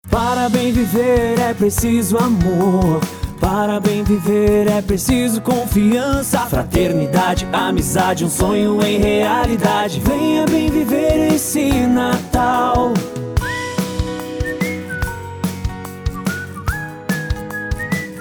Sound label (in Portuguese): Jingle Natal Rede com bateria